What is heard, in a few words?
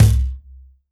WORLD DRUMS, MIXED DRUMS; Best Service ProSamples vol.52 - World Instruments 2